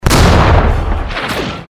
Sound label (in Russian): tank babahnul